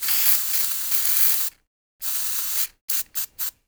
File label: HAIRSPRY; AKAI S-Series CD-ROM Sound Library VOL-6